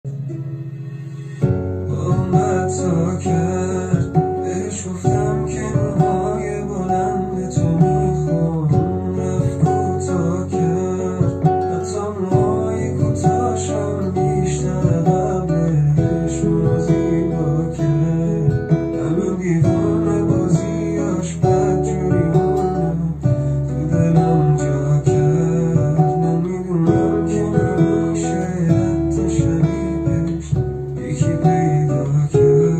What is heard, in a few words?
guitar version